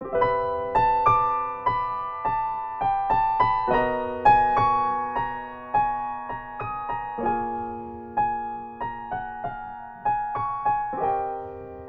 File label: Piano Ver.